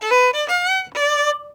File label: Violin Missednote; the princess and the frog; Diner; Light Buford